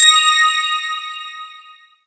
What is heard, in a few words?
Popup alert; HP iPAQ Glisten